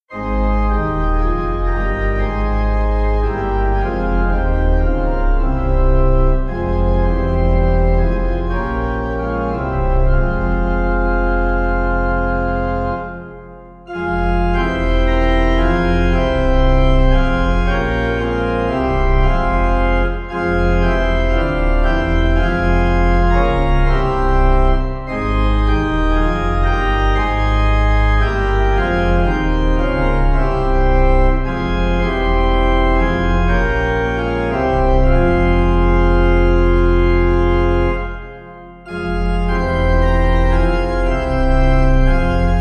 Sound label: Pipe